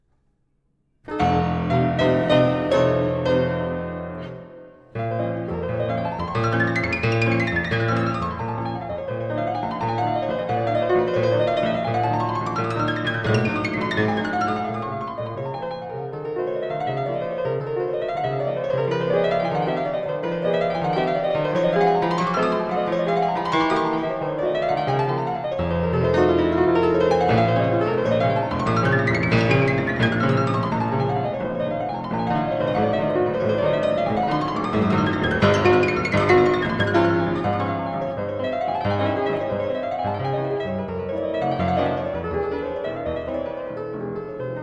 Preludes Op 28 - No 16 in b flat minor spoken, Piano; Frederic Chopin